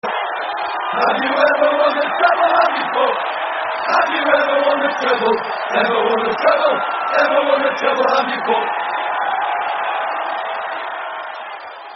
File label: Treble, With Crowd; Man United